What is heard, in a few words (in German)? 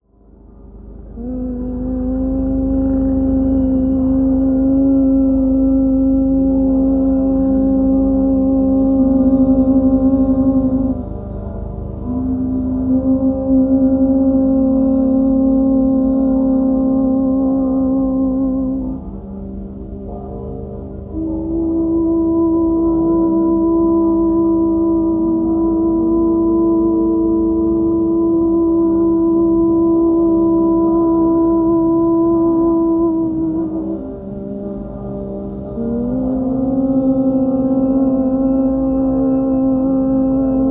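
Tönen mit Glocken und Hubschrauber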